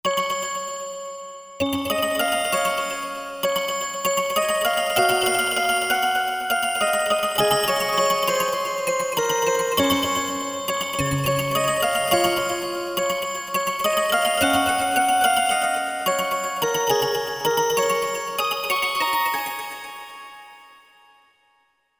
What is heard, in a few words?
Metal Wave, Korg MSC-14S; Korg MSC-14S Sound Effects